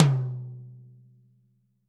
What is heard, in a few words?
TT, Toms; Bob Clearmountain Drums I